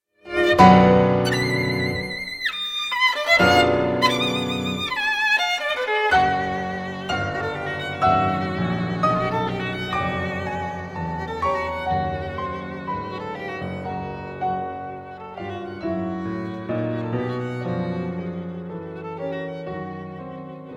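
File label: sonata in e flat major op 18 for violin and piano 1st movement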